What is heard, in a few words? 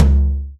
Tom